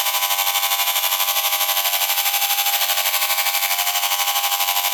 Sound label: Fortress Detection Laser Loop; Ambience; Gameplay; Warframe; Grineer